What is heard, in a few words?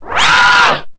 alien hurt; zombie